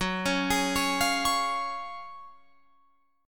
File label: Gb Major 7th Suspended 2nd, Guitar Open F; GbM7sus2 arpeggiated; JGuitar